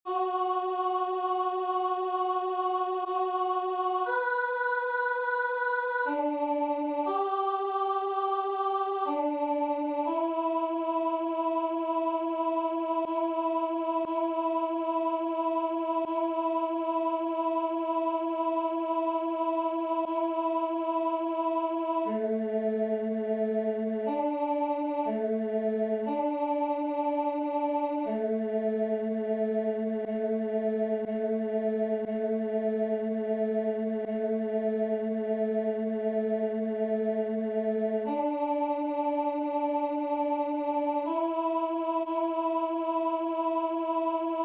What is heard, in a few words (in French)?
Choeur Alt; Monteverdi; Vespro; Ave Maris Stella